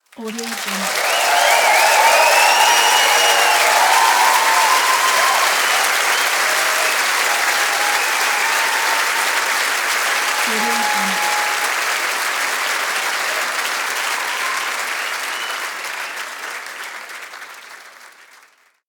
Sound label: that applause